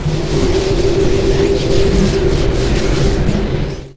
frozen shield; zombie elimination

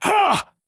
human hurt

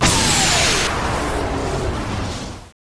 revived

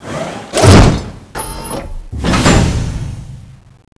attack; mad machine

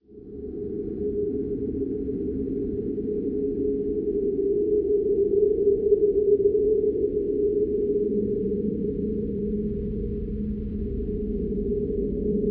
ambience

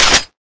beamgun charge idle, weapons

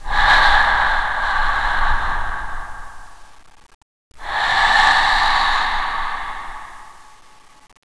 zombie ghost idle; ambience; ghostfury

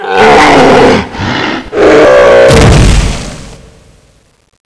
death

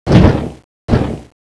stun; angra

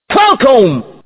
falcon, weapons; balrog9

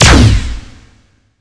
gun, weapons; leapstrike

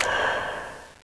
zombie ghost footstep; ghostfury

